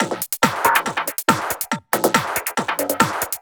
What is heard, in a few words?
Loops 140 BPM Hihat Percussion Loops